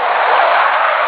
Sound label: skid wat; RedLine demo